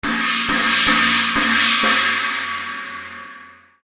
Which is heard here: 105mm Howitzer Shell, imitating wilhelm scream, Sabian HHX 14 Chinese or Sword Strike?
Sabian HHX 14 Chinese